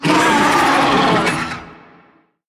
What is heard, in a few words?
dragons brood mother roar reanimate